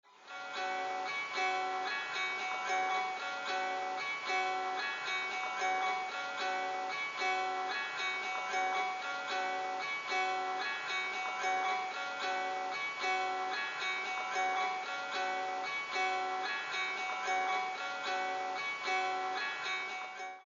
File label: keyboard